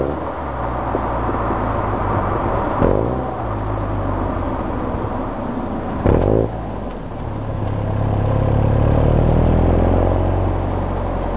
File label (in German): auspuff